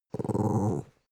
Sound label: dog small growl very soft short